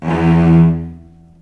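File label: E1 arco long cello, right; East West; The Ultimate String Collection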